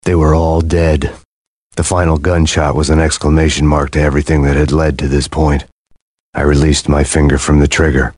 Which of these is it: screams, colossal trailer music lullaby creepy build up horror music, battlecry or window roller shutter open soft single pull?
battlecry